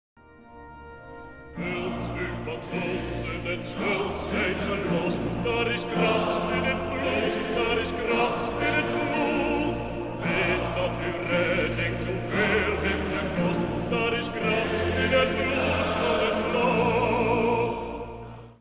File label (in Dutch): Daar is de kracht in het bloed, mannenkoor